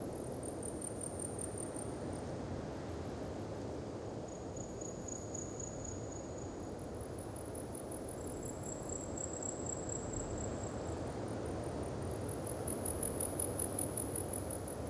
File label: ambience soft wind crickets; Rust